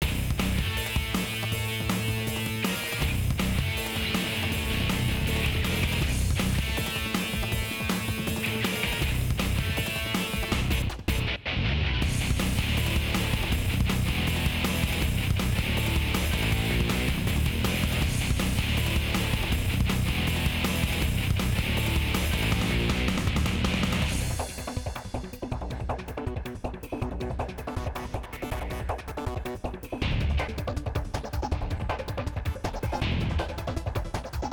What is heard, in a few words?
Battle; Draculius